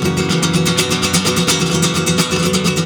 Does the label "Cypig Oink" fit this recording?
No